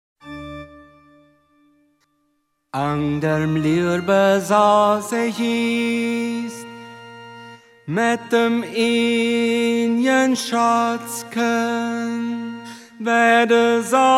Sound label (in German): Tenor